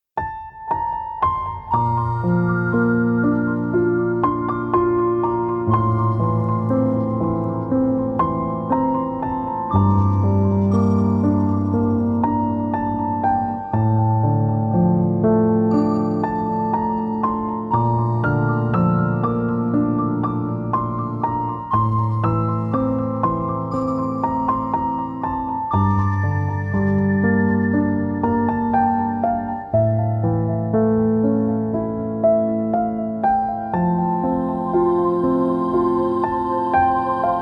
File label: Piano